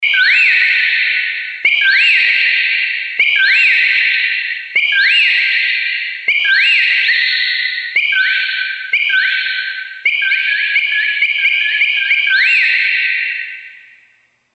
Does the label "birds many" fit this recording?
Yes